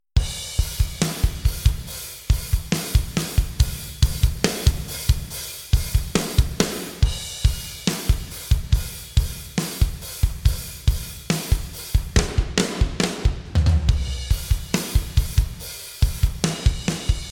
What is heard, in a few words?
Black Drums Main Set